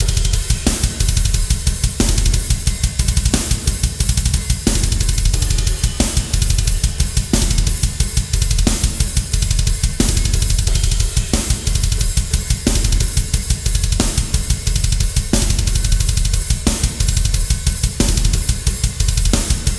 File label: only drums